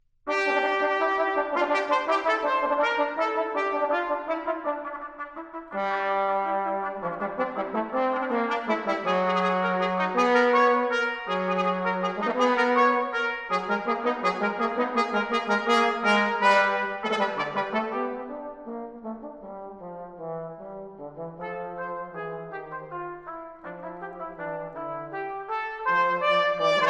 Divertimento For Trumpet, Trombone, & Piano, Allegretto